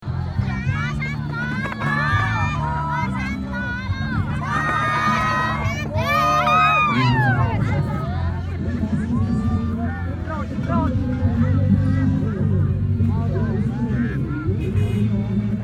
fullmoon gambling, laos; Animal-dice / gambling at Muang Sing's notorious full moon festival.

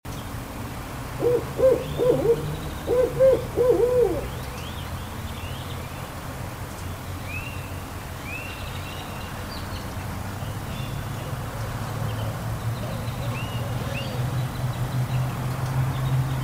family owl

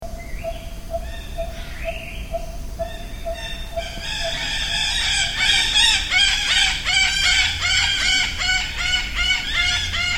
Ta Prohm birds, cambodia

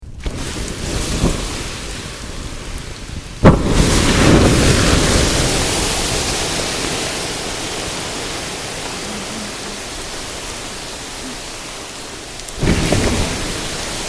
dousing the fire

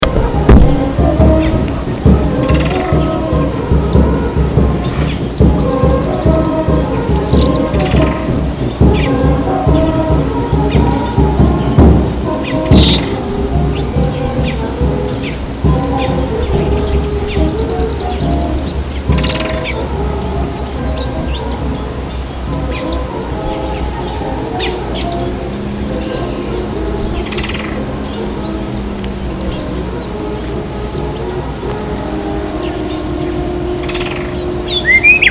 Nanegal evening

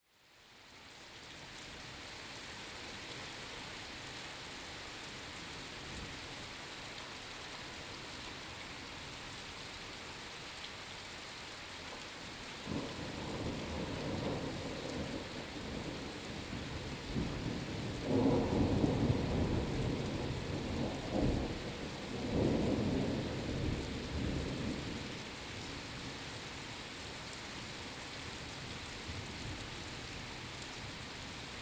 thunderstorm; Lambrugo

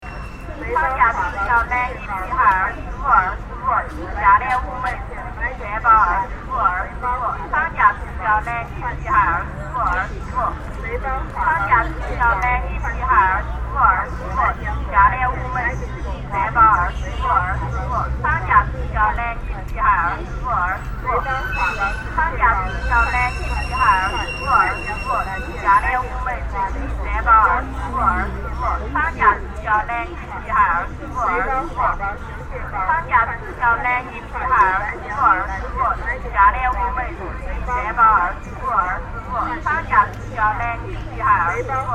phasing megaphones; china

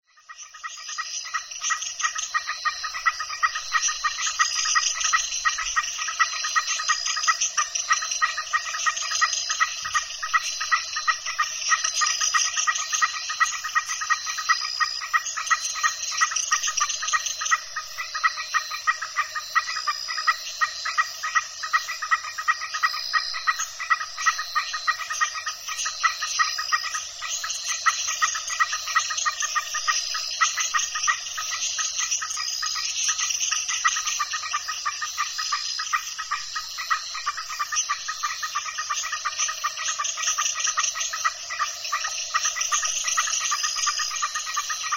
El Cuyo MX Frogs And Insects At Dusk

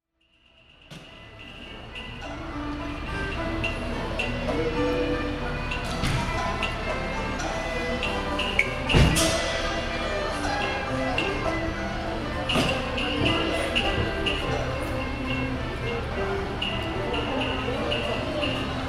Chinese Operas, San Francisco

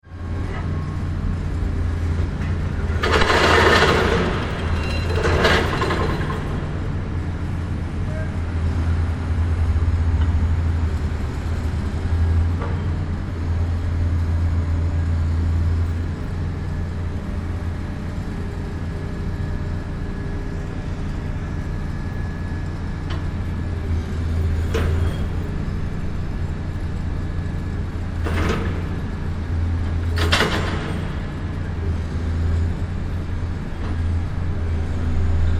Construction

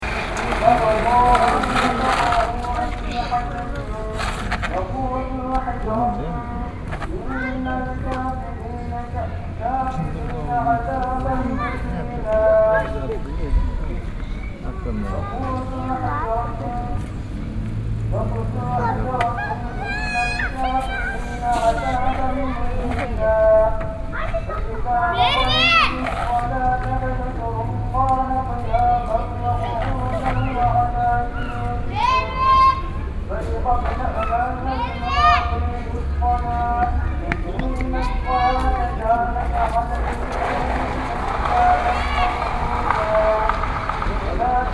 Konya Mosque

